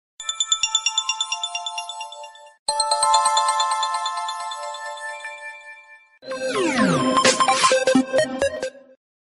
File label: echo sms ringtone; galaxy s4